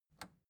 record player power push off

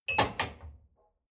dishwasher cup place

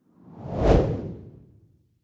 whoosh simple hard long

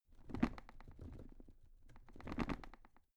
drawer cabinet carton rattle

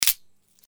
match break

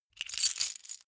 key ring large place

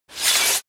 sword drag slice

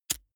lighter wheel spin

